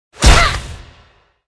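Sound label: night guardian attack, monster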